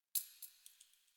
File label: Coin Drop